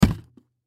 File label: Alarm Clock Hit; Alarms; Household; Electronics